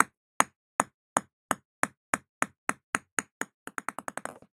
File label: ball, toys